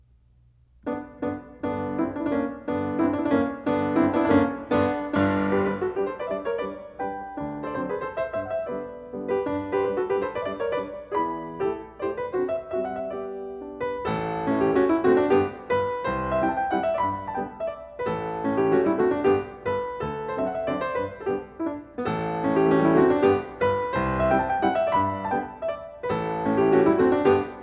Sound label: Mazurkas for piano, No. 1 in G; Chopin